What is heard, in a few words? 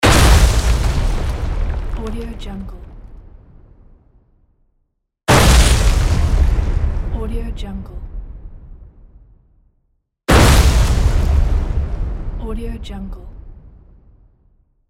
bomb